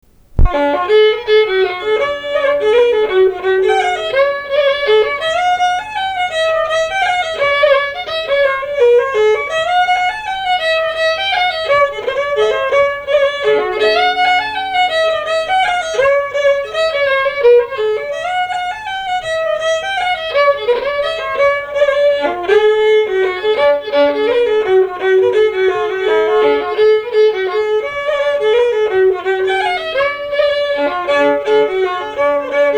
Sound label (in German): vals D dur